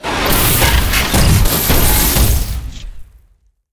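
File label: Boss Mech Gorilla death, monster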